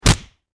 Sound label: Impact Punch Face Fist Cuff; Punches and Hits; Combat